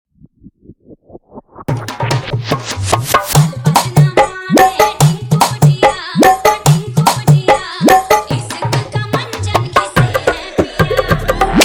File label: Hindi Dj Remix Songs Edm Bass Mix